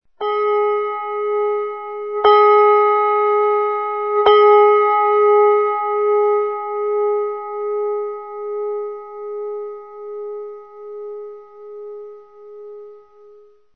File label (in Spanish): CAMPANADAS; Sonidos/instrumentos